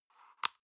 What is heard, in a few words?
paper box small close soft